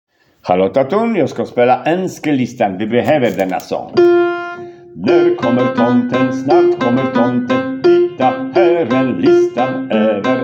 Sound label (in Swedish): sop ten bas